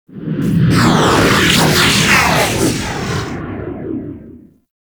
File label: Fighter Ship Close Fly By, Space Fighter Ship Engine; Warframe; RailJack; Enemy Ships; Corpus